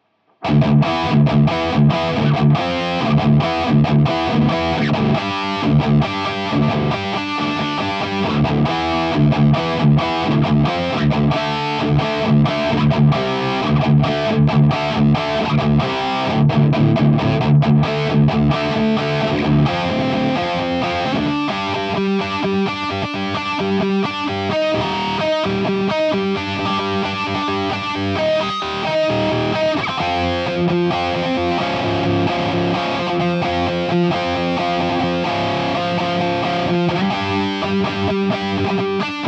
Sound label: drop d, pickup